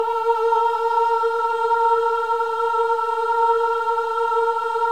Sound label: VIB M A#4 -L, Symphony of Voices; Spectrasonics